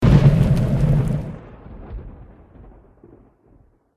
boss buff in

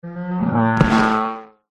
polar bear death

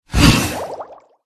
hydra death

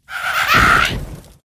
witch attack voice bottom

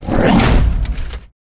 Rat block

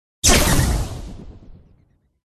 phoenix buff; pets; feniks

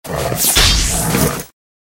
Tiger

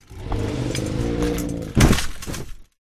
Rat die

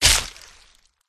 werewolf boss attack contact mid

